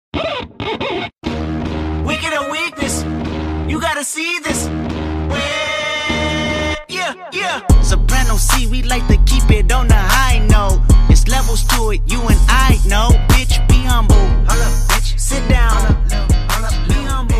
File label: new hip hop ringtone